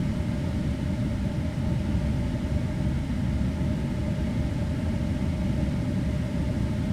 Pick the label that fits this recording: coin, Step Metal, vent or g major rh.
vent